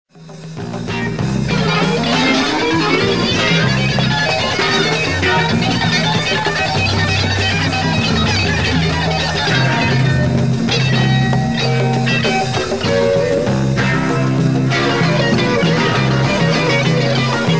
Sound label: get funk